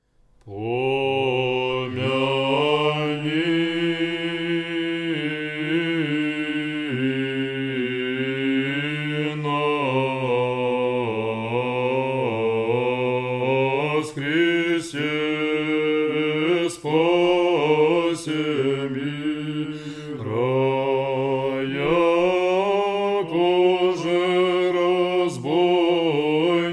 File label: beautitudes znaminie tone 8